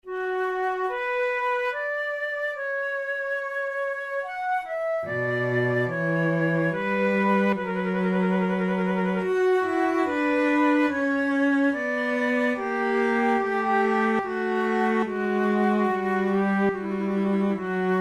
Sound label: adagio, flute